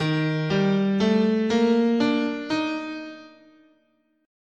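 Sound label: E flat Chinese, guitar, scale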